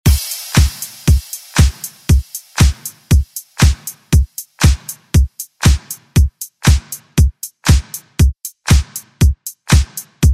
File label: ReDrum